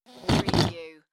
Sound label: Whoopee Farting Blowing Raspberry Sound